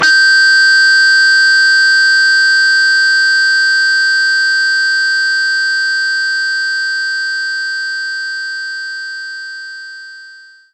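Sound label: Guitar Harmonics B2; knights try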